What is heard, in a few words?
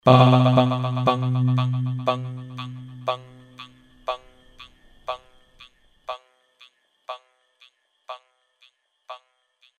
deelay going high